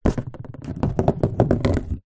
office marker lid clip on down then roll on empty cardboard box